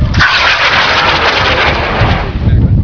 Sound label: rock, rockets